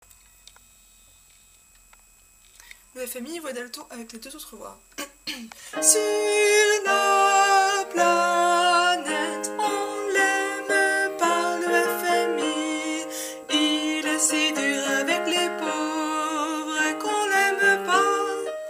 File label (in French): alti, chorale voix